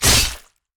basic attack sword; rengar

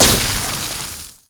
basic attack; LoL; frostfire brand

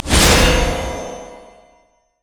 battle roar armor buff; rengar

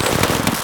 fireworks gatling gun; Fireworks Corki

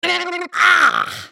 dying; Heimerdinger

